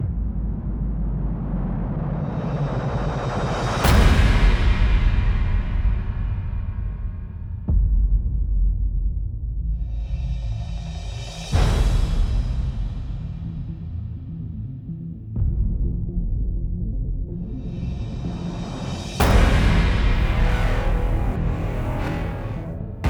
no choir no percussion

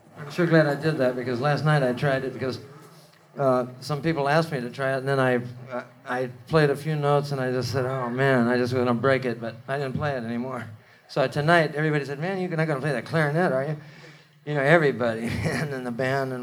talk clarinet

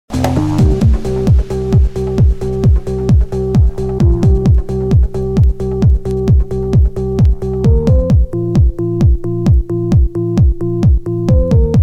oriental trance

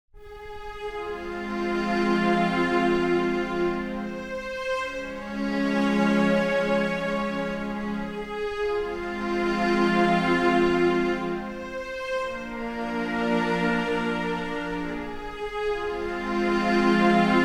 string solo

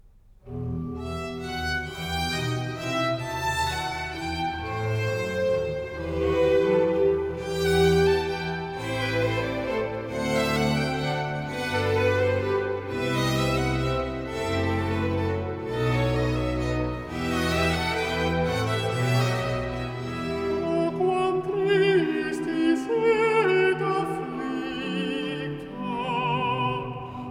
stabat mater for alto solo

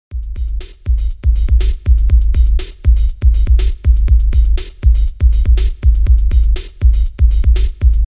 bass beat

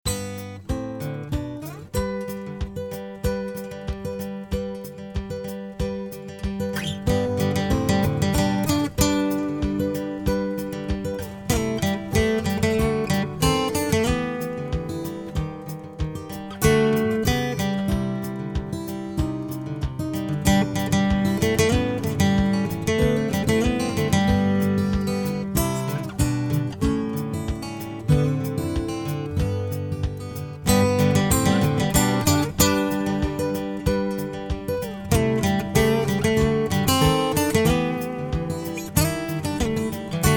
acoustic

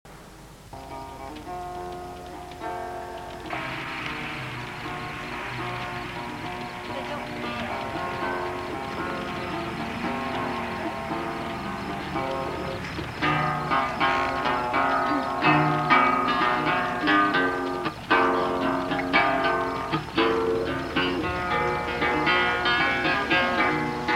campfire guitar

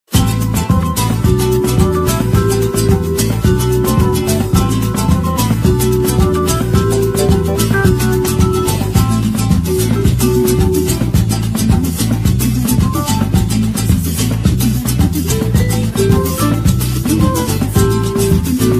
instrumental

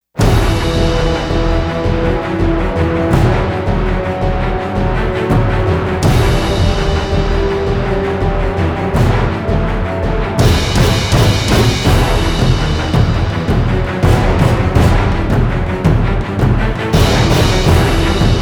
no choir

